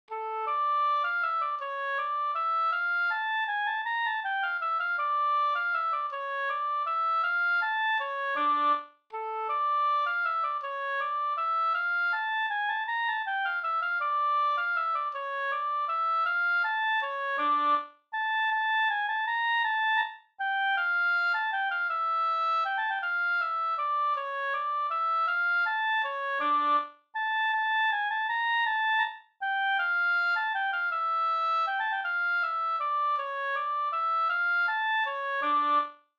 vals; Norra Rada

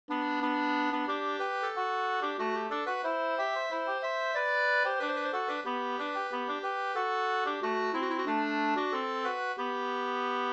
brudmarsch; fr Vaddo

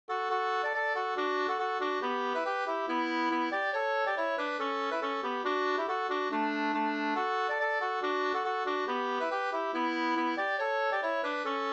schottis; e Jonas Bystrom An Flen141 2st

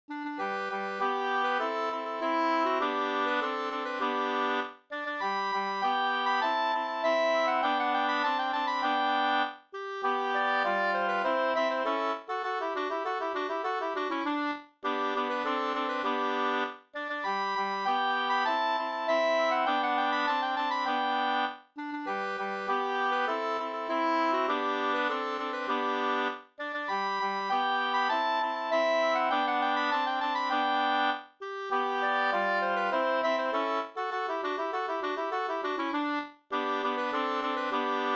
vismelodi; Tanker du att jag forlorader ar

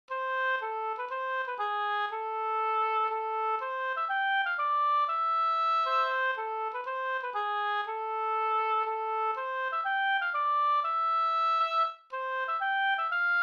polska Bodapolska

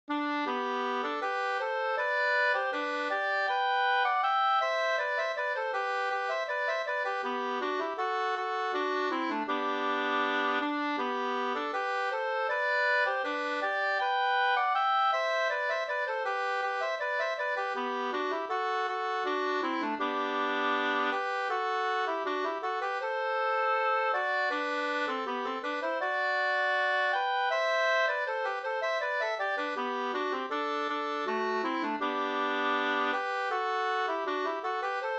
brudmarsch

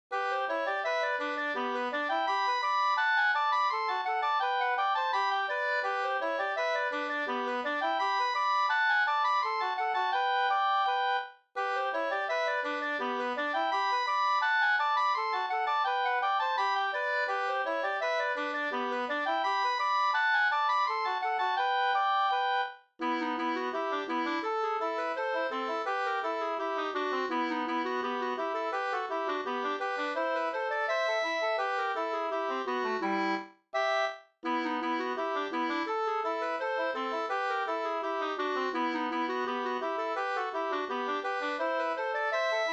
polka; Adelsopolka av Carl-Erik Hogstrom 2st

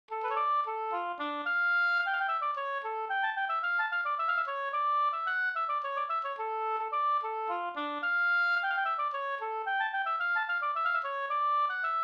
polska Bingsjopolska

